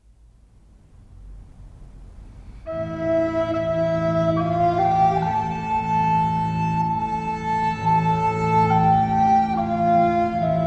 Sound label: Irish Lullaby